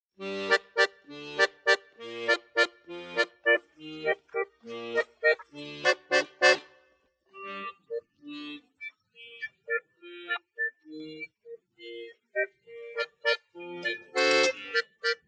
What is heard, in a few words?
Accompaniment Only